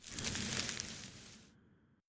Object Grow Flora; LevelEditor

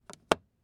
Bike Basket

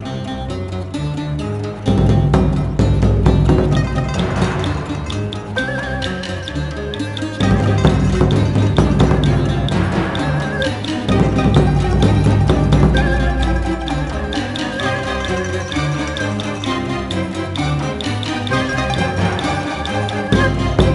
BGM Boss Generic

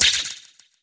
down shakers; gonner linux macos ps4 switch windows xbox one gamerip 2016

